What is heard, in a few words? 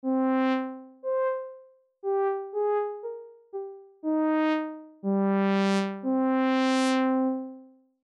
ADSR Filter Env; synthese sonore les enveloppes